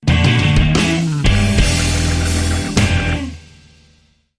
Blues

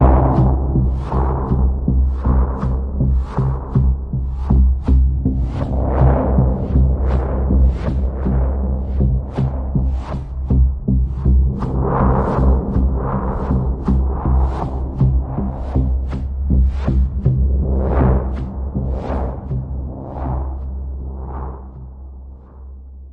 Pulse, Key of C

